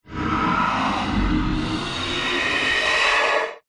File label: horror demonic growl huge growl wide